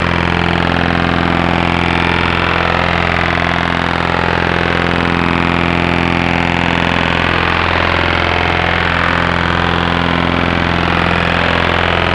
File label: OTH Radar on 80m in Region 3